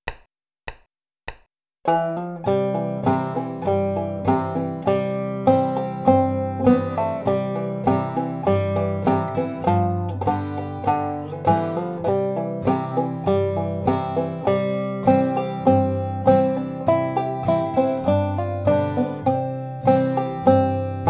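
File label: melodic clawhammer, banjo; soldiers joy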